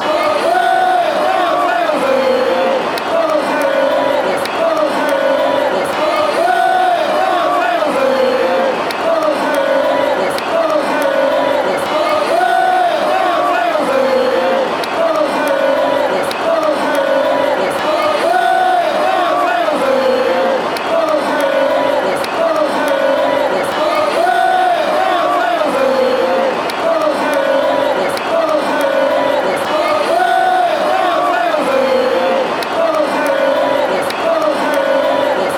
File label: aussie aussie, Australia Soccer Team football song & Aussies chant